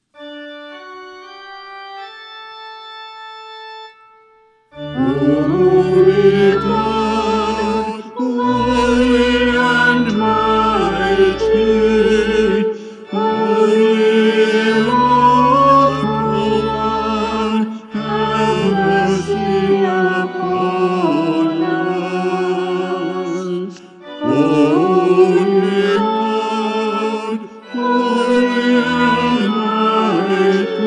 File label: Trisagion; Hurd